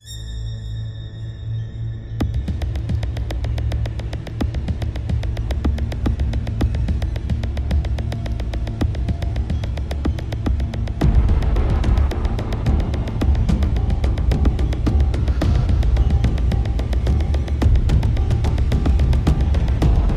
Suspense

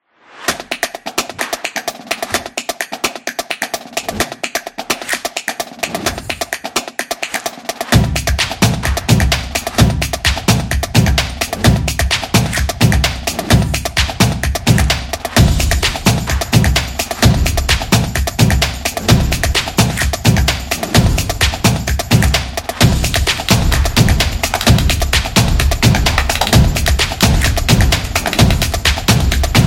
Sport Drums

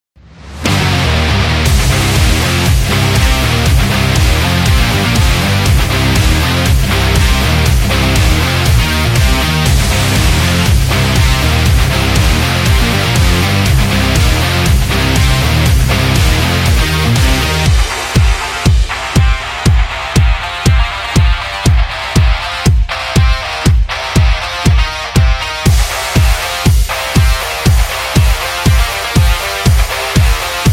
Rock; gym rock

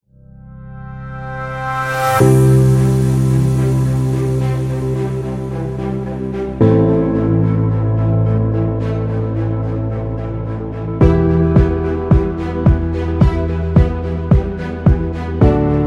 Soft Inspirational; healthcare

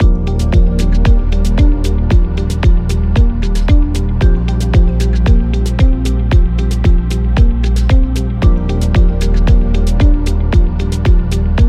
Simple Loop; corporate promo

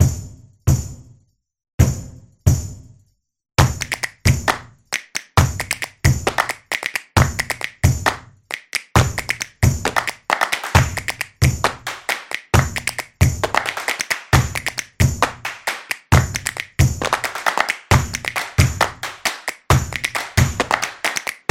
Claps Snaps Stomps; percussive nation vol 1

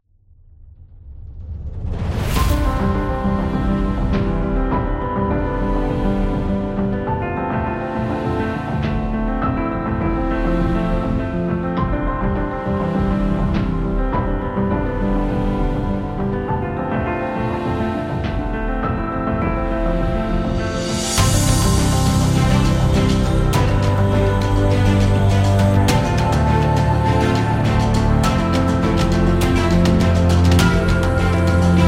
Cinematic Motivational Action Epic; epic trailer

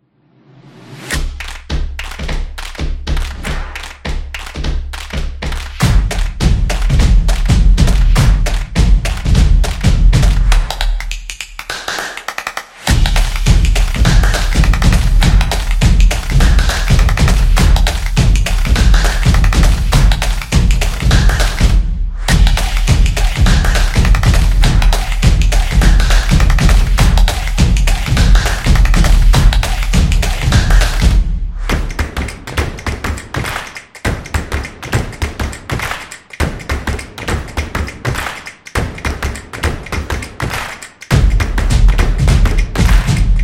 Car Commercial; event promo

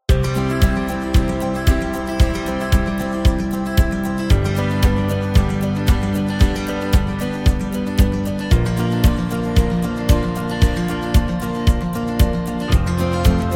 Motivational Background; positive vibe

